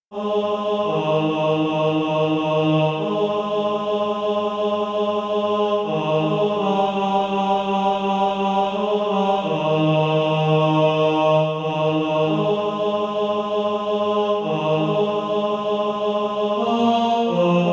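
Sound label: tenor, SATB Practise Music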